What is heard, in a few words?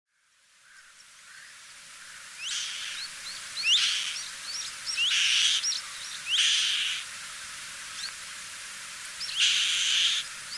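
Black-winged Kite; katagurotobi; ishigaki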